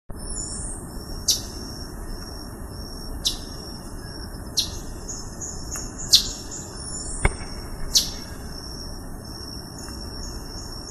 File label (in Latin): Tachyphonus coronatus; PP Mocona